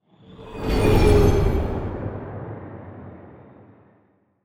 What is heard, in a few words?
ship small ship move; synthqueen; Stellaris; machineage